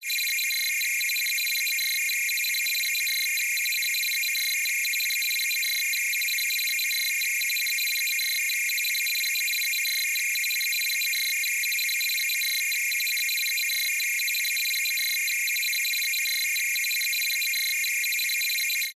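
science fiction telemetry data receive transmit fast; zapsplat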